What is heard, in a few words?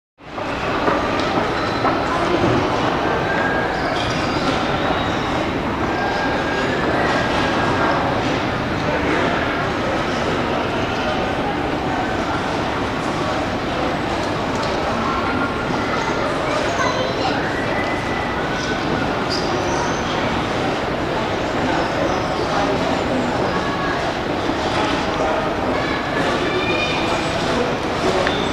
Airport Air, int airport; Airport Heathrow Air